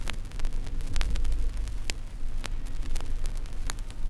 Crackle, Vinyl Noise

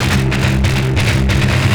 Treat Guit, Industrial Nasty

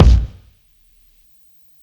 Kick, Psychedelic Drums

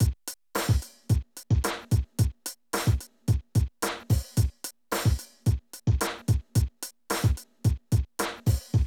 Drum loops, Hip-hop

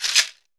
cabasa; Brazil

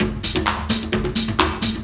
Dance Grooves

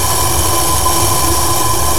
VOX NOISE; AKAI S-Series CD-ROM Sound Library VOL-5

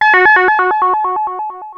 ARP 2600 A2, synthesizer; Hollywood Edge - Giorgio Moroder Rare Synthesizer Collection

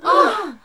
NOISE, CLASSIC VOX; Best Service; Hallelujah

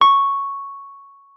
WUFF C#6, WURLITZER; Xsample VOL-1

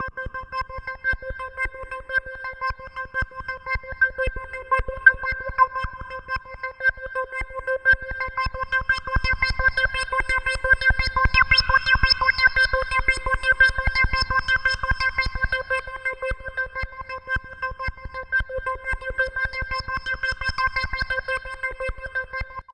C4, CLUB ORGAN; Roland JV 2080

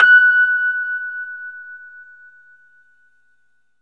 Rhodes fast F#, 3D Keys; 3D Sound; 3D Keys VOL-1

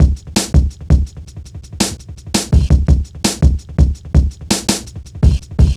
Funki Tuff, Jungle, Drum Loops 166.5; Zero-G Jungle Warfare 1, 2 & 3

